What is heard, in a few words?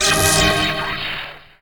magic holy miss